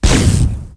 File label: dispersion pistol